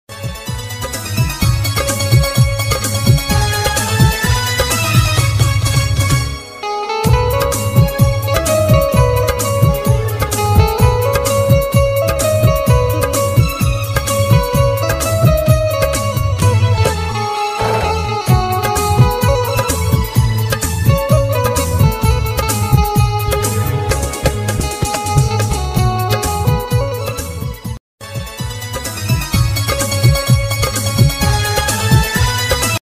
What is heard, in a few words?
hindi ringtone